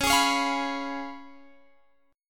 Db 7th Db down Guitar Half Step Down

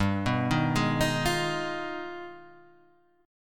Ab Minor Major 13th

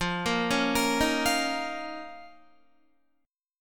F 7th Suspended 4th Sharp 5th F down Guitar; F7sus4#5; arpeggiated; JGuitar

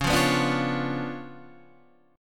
C sharp 7th Flat 9th Guitar Open D, chord

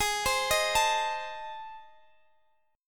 A Minor Major 7th Flat 5th

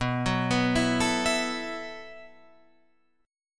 B 7th Suspended 4th B down Guitar Open F; B7sus4; arpeggiated

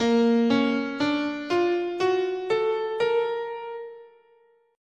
A sharp Augmented, scale

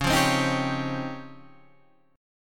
Db Major Sharp 11th; strummed

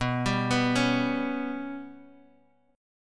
B Suspended 2nd Sharp 5th down Guitar Open F; Bsus2#5 arpeggiated; JGuitar

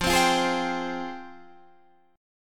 F Minor Add 9th F; strummed; guitar; JGuitar

